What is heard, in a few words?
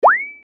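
ultra SMS short futuristic sound; korotkiy futuristichnyy zvuchok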